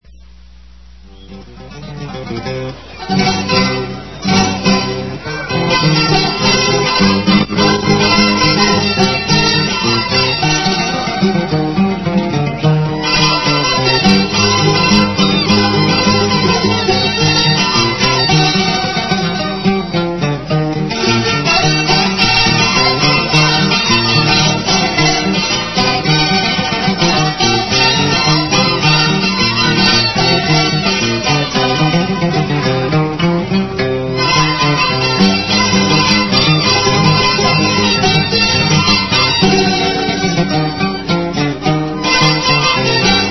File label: Huaracinita